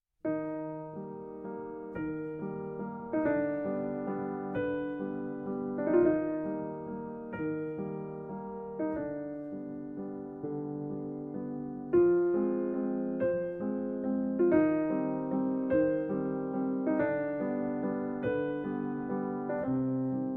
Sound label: Pieces For Piano; From Amélie